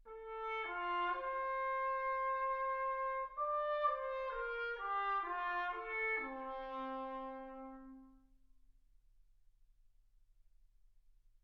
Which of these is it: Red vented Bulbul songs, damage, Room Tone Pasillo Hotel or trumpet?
trumpet